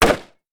m16 exterior single shot; colt

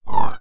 glass swoosh

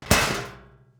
metal tray drop, metal tray dropped

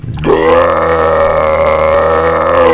AHHH

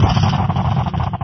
engine start

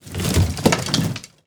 wood debris, wood debris crash

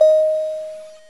BEEP

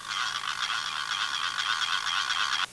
wooden spinning rattle ratchet

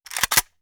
rifle reload

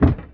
gear shift

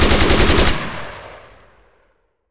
machinegun fire

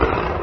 truck shift down

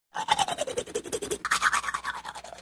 tooth brushing

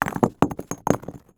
brick drop bounce

